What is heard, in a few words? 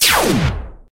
fire piranya